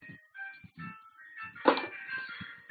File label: drum kick